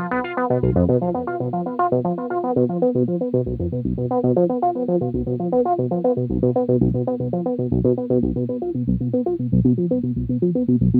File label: Jazz